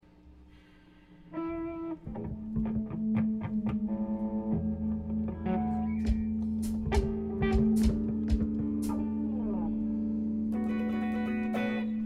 Room Mic

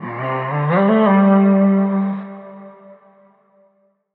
vocal memory F; SD AURORA Sample Pack; Slate

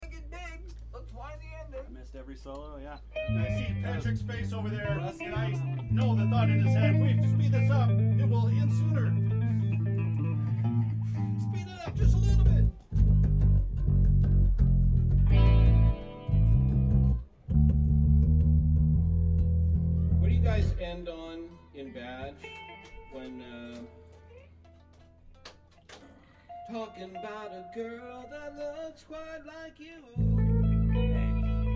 Room Mic

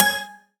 tonal one shot Ab; Pop; Slate; PASADENA Pop Sample Pack; Tonal One Shots

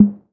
Tom Hi Dark, 808 Toms; Slate; Vintage Drum Machines Volume 2 808 Edition

